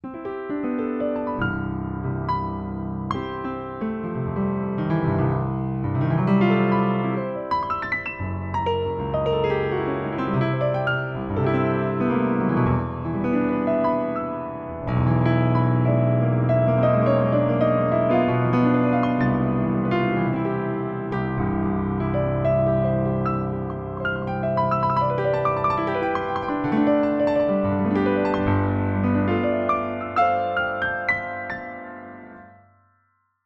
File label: improv bass to treb